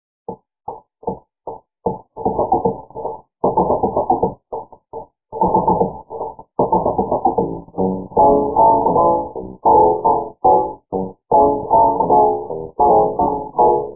bat eletr

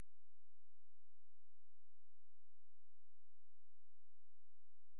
BATIDAO

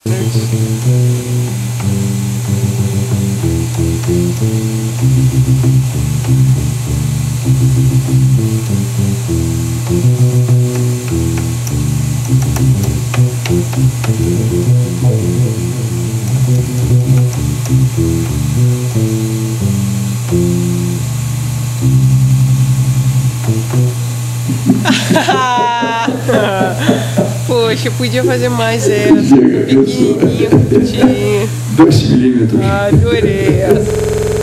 contrabaixo

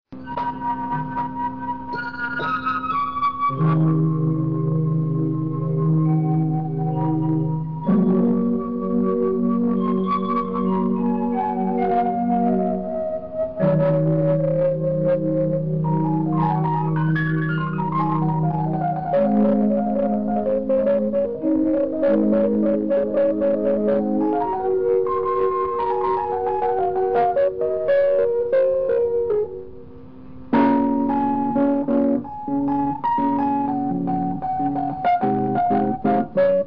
orgao

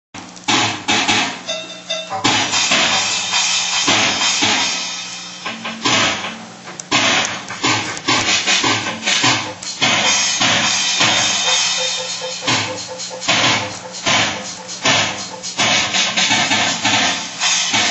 baterias

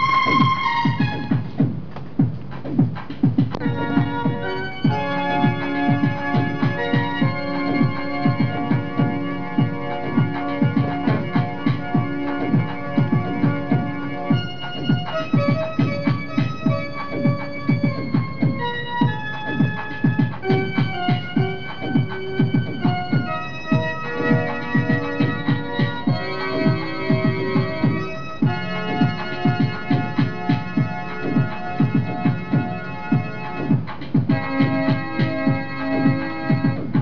orgao

